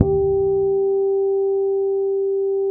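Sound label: G 4, fretless harmonics, bass; Spectrasonics; Bass Legends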